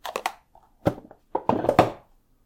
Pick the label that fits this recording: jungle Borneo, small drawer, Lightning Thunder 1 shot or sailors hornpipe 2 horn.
small drawer